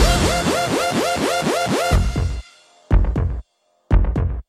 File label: ALERT; SCREAMINATOR; kermis geluiden